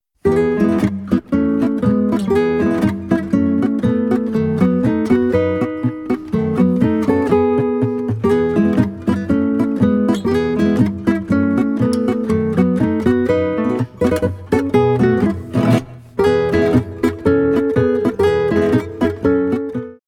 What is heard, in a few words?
polka